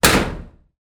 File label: impact metal object strike metal garage wall